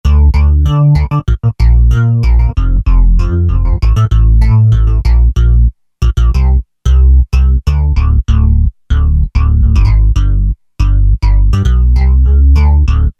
FAT S. BASS, Roland SC-880; Factory Patches

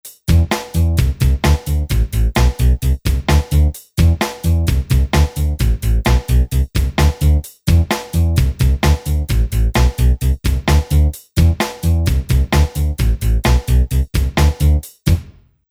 Ska

